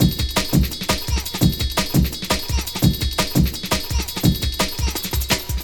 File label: Break, Drum & Bass, Beats & Breaks